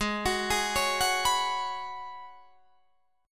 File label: G sharp Minor 11th G sharp Guitar Drop D, chord